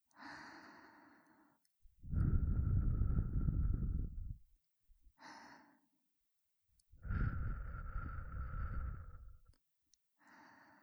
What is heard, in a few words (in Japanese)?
息吹きかけパート